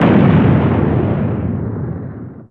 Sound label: explosion; Twisted Metal 2